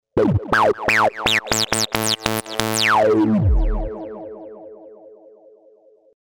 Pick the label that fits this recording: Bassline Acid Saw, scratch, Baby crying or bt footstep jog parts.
Bassline Acid Saw